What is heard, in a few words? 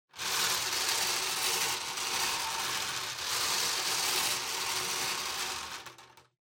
Bingo Machine Spin Long